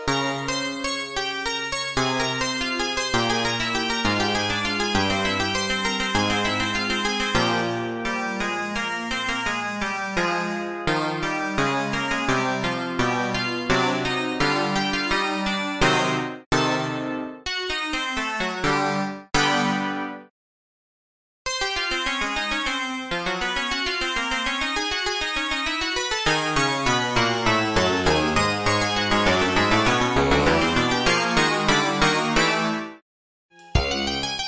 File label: korg x5d chorus clav